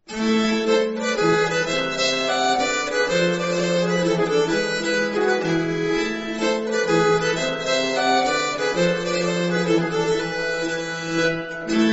Polska, nyckelharpa; Ewert Ahs

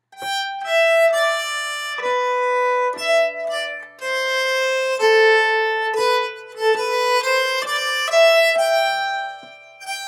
voix, nyckelharpa

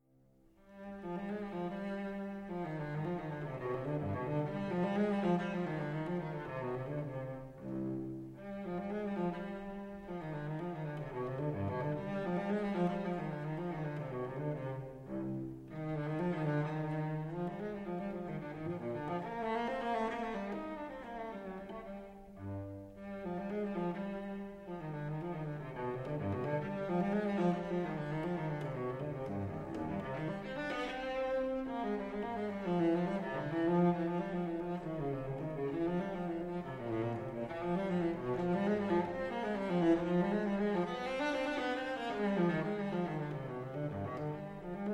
gavotte, nyckelharpa

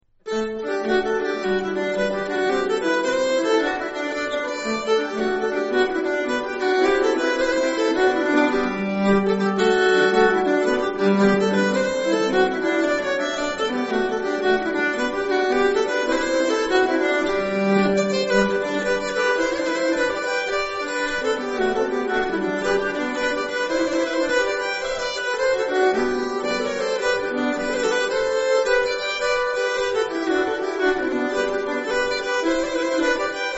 jig de valcartier, nyckelharpa

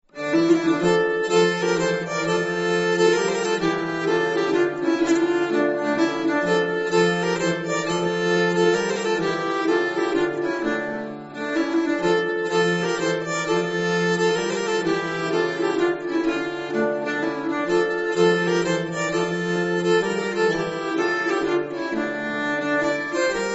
mazurka, nyckelharpa; st genest

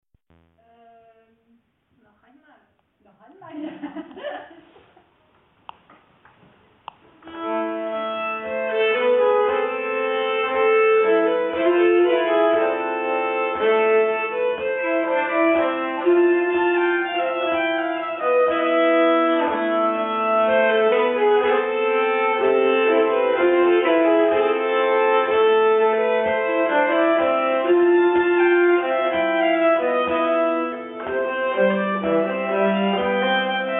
Polonas, nyckelharpa; Polonas Am Efter Blomgren

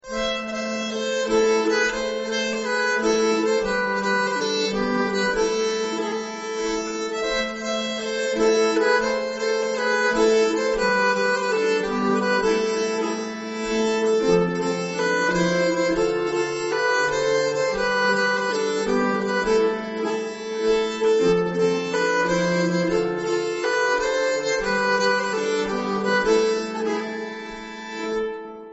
polska, nyckelharpa; fran socourt

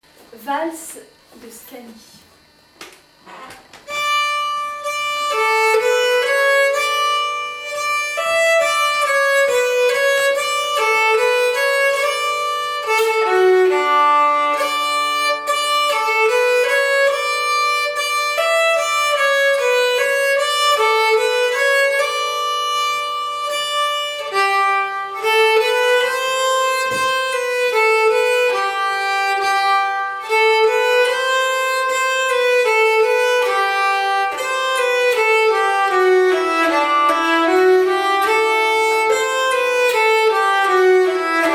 Vals Scanie

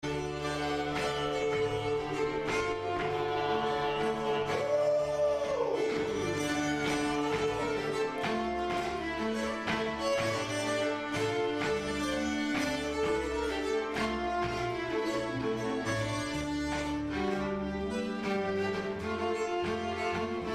Polska, nyckelharpa

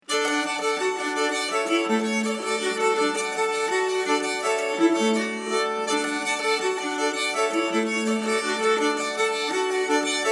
Schottis, nyckelharpa

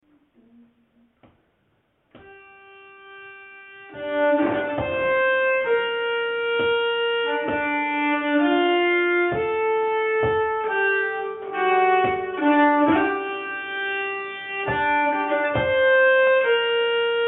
polska, nyckelharpa; polska Jernberg

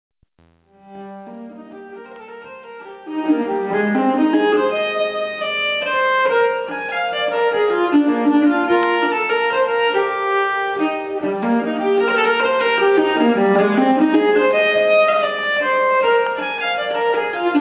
Odetorpsvalsen Rapide

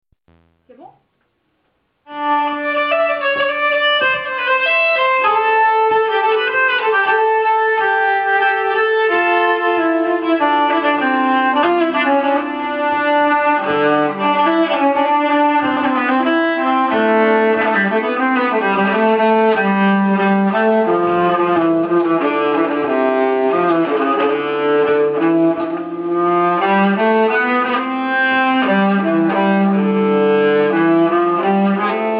menuett, nyckelharpa

